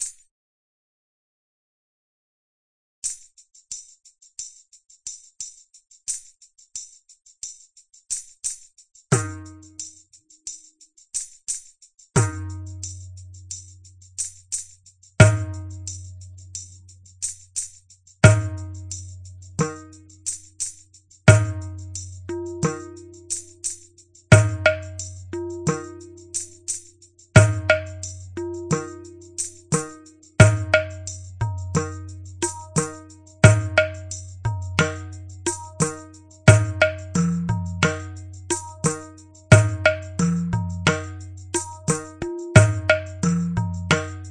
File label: ghatam, grooves